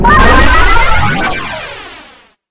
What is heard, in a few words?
Retro HiTech